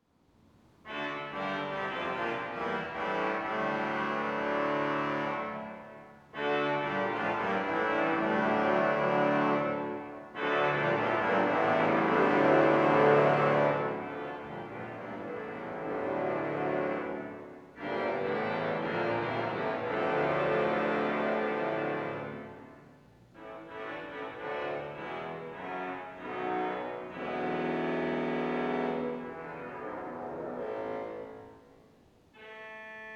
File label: swell reeds, Swell reeds Starts on Trompette 8' (real). Add Cornopean. Add Bombarde 16'. Close box.; phoenix